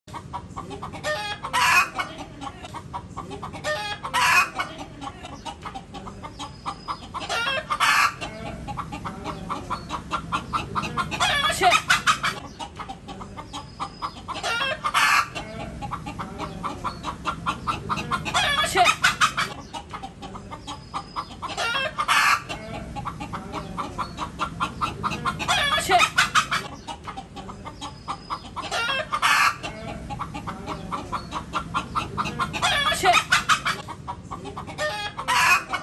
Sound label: hen voice walk ringtone